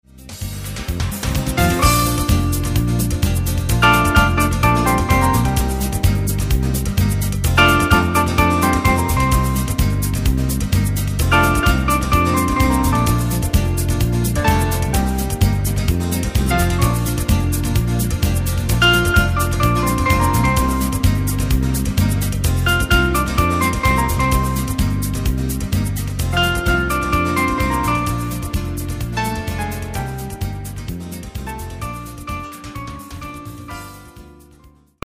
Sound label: piano